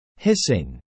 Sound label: hissing